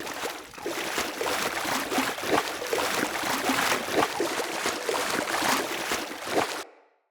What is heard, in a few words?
Horse Step Trot Loop Water; Mendicant Horse; Foley; Footsteps